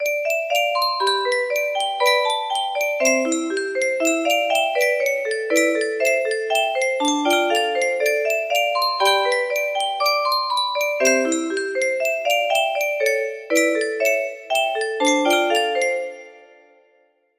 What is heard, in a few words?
melody, music box